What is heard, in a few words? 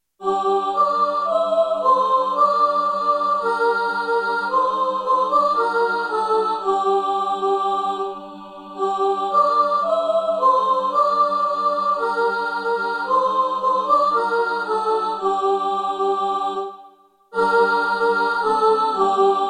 soprano, choir